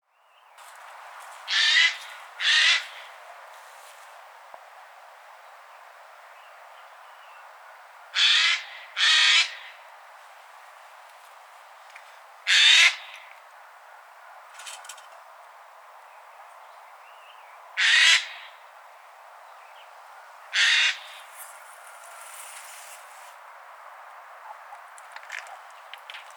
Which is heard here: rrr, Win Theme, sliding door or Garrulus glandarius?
Garrulus glandarius